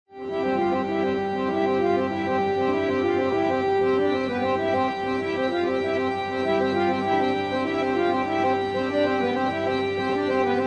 armonium

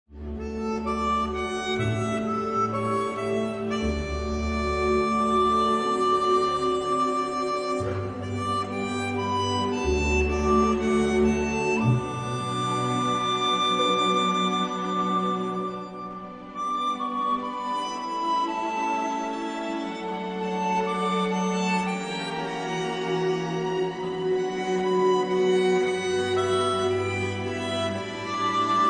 armonica

